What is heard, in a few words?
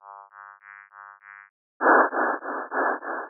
bright loud diff